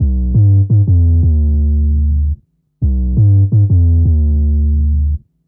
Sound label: Hammer Bass, Drum & Bass; Fluid Dynamics